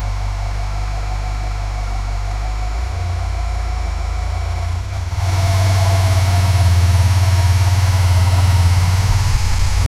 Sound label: porsche drive engine sound